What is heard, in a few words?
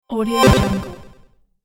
arcade platformer summon impact